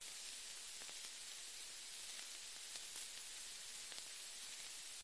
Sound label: household kitchen cooking steak fry sizzle